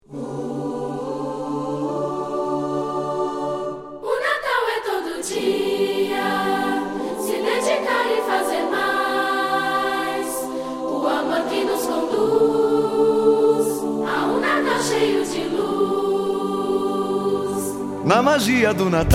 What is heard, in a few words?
jingle